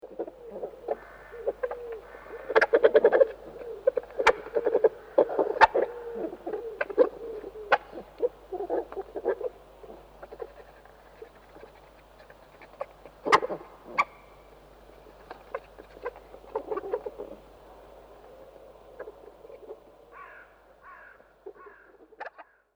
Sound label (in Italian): tasso, Mammiferi; Monte Pisano; Animali; I Tesori del Monte Pisano - I Volume Gli Animali